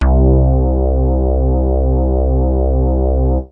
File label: juno bass; Basses